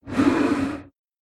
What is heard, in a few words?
vox idle